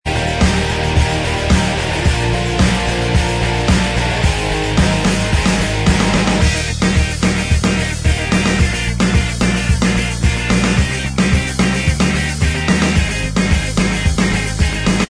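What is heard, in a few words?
instrumental track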